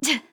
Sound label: grunt attack; Paladins; Skye